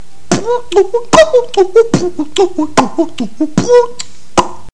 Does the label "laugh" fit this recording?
No